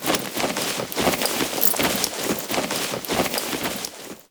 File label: Shake Movement; Warframe; The New War; Drifter; Foley